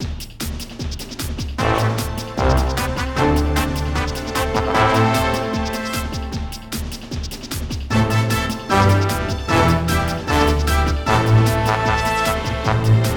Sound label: Music Gameplay Victory Loop